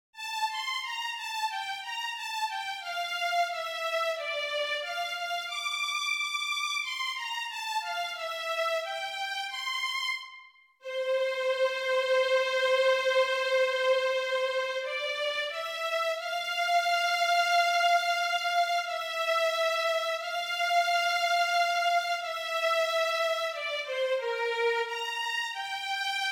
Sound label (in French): violon; Tu es prêtre à jamais